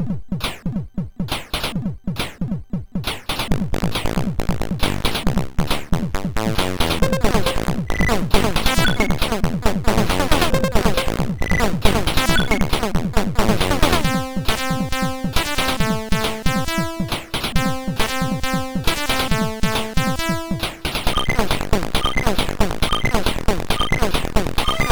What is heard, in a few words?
Sound Sample, sid MOS6581R4